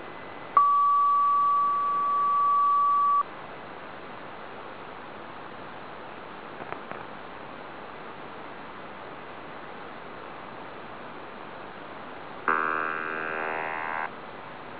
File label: DAMSON, DIGISONDE IONOSPHERIC SOUNDER; DOPPLER AND MULTIPATH SOUNDING NETWORK (DAMSON)